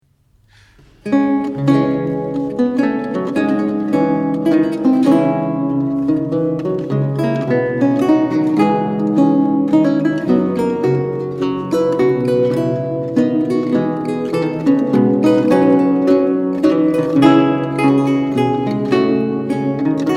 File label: Courante from Pieces In D Minor And A Minor